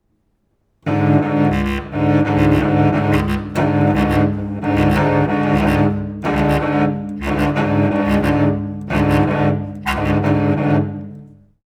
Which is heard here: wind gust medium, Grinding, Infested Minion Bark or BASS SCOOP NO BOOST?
Grinding